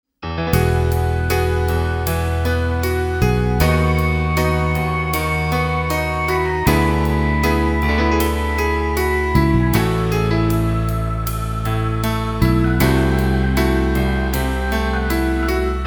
blues; anthematic

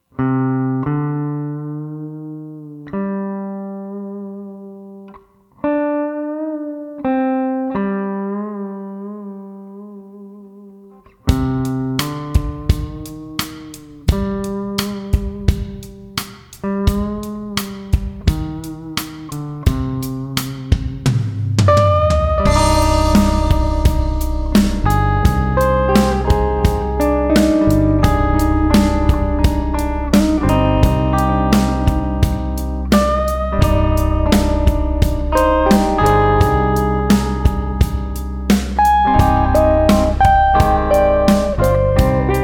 hirajoshi

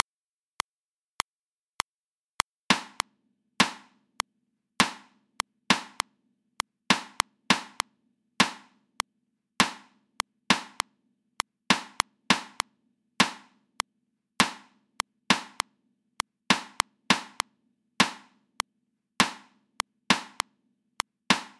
reversed rhythms at 100 bpm

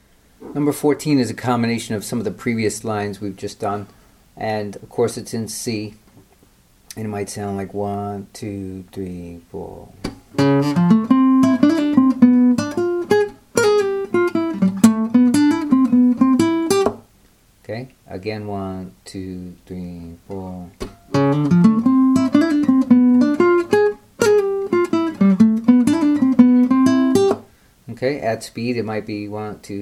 jazz licks

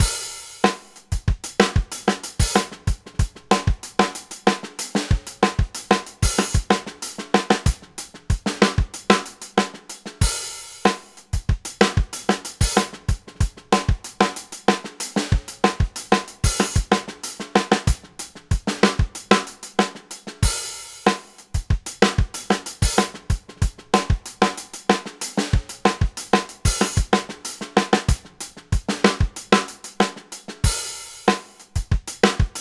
funky loop

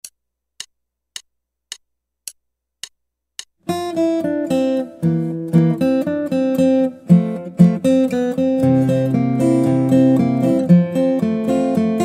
Guitar

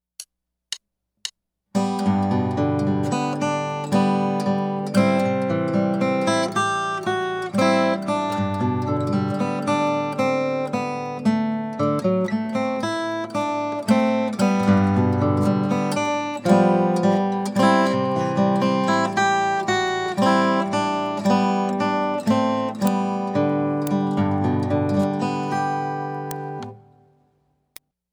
Bluegrass Waltz

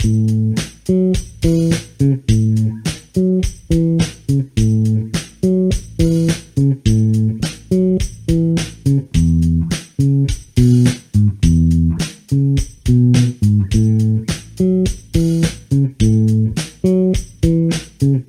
bass lines

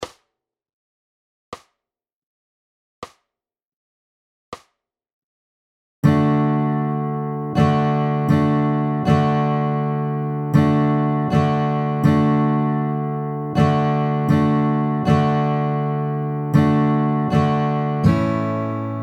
D D A A E E A A at 40 bpm

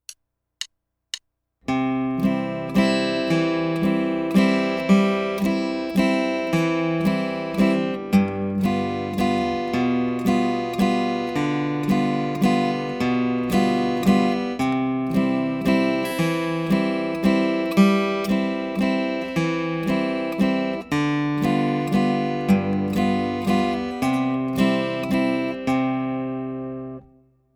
Bass Solos With Chord Accompaniment

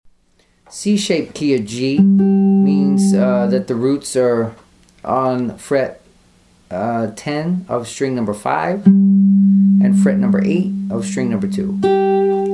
easy blues licks key G shape C

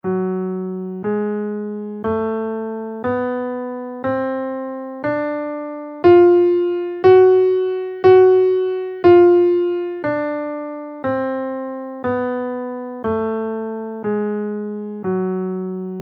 harmonic minor b5 scale in Fm

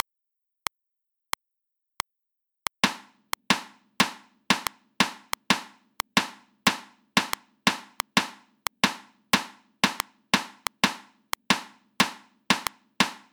reversed rhythms 16ths at 90 bpm

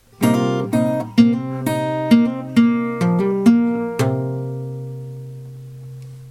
a shape minor embellishment lick a

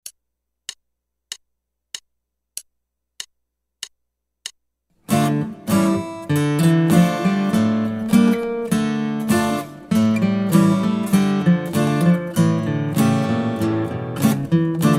Guitar

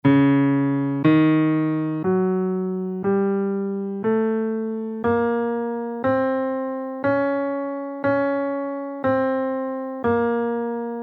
lydian augmented 3 scale in Db

